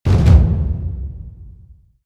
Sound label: Start Level; bronze hoof